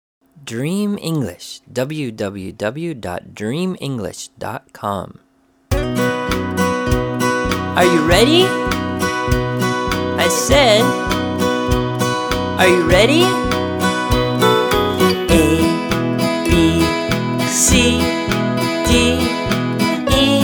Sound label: Traditional ABC; حروف; زبان انگلیسی برای کودکان; زبان خارجی کودکان; آموزش صوتی زبان انگلیسی